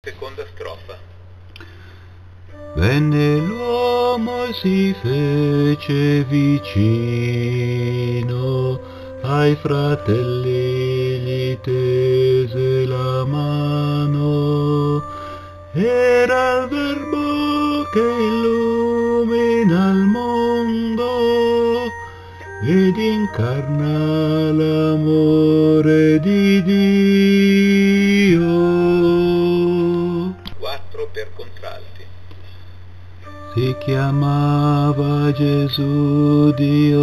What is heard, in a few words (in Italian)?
contralti